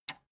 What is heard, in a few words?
metal button tiny release disable